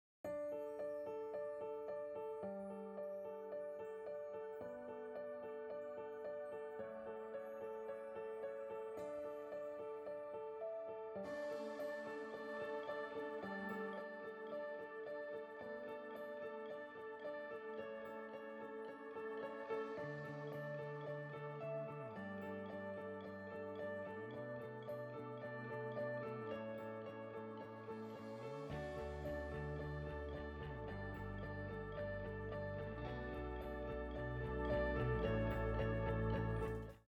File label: Music; Wisconsin Life; Kickapoo